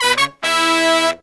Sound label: Pop & Funk Brass, 120